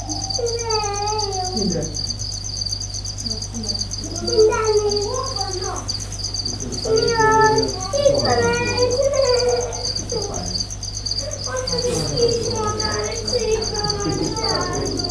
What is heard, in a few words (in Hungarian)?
látogatók tücskök, terrárium; Győri Zoo 2014